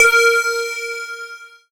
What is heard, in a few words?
BELL-GONG, A#; Big Fish Audio